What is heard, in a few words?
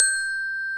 GLOCKENSP; Back In Time Records; Big Bang